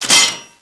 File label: trap close; zod